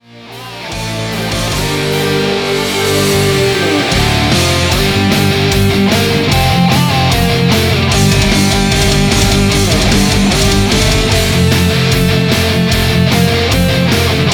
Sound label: Karaoke